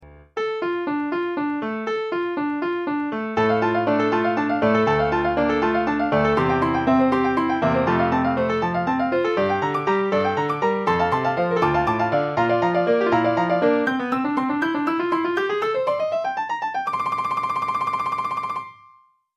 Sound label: The Piano Duet; halloween hungry ghost month special; Corpse Bride